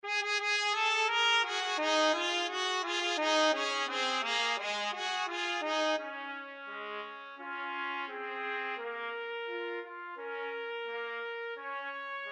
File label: 2 trumpet, trumpet duet